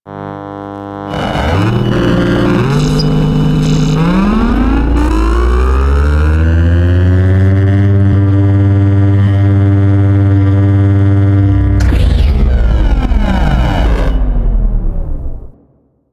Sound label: Eye Charge Up; Ambience